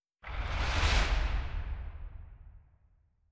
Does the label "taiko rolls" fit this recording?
Yes